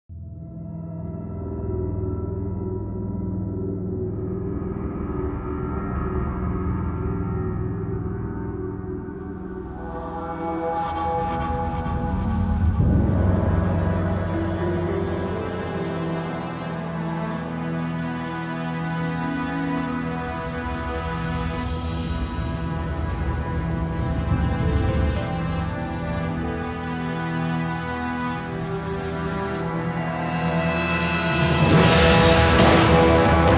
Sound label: Menu Music; Far Cry; CryEngine